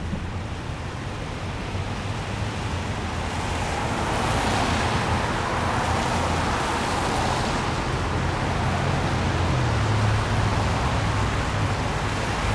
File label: City Ambience Oncoming Traffic Rush Hour